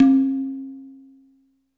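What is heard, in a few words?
ambience industrial, ethnic percussion; Drums In Your FACE!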